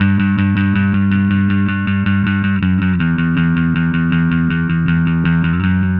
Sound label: Happy Pub Bass